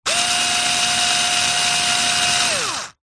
industrial power tool electric screwdriver single short burst